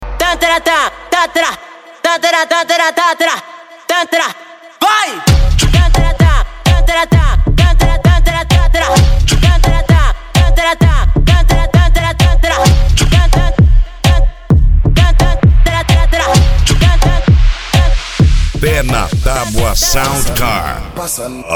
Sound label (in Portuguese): sound car carnaval